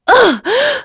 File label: Damage; Veronica; kingsraid